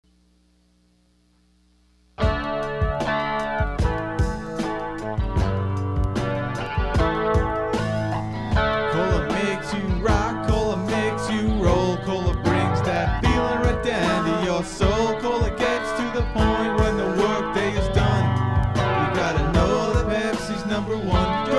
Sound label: pepsi jingle no vocals